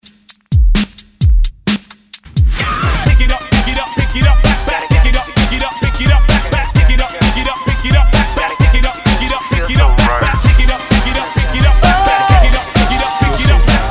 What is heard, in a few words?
130 Bpm; DJDOLLS